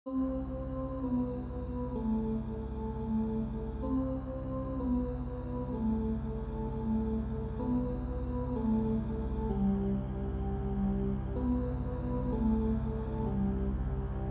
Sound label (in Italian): base la minore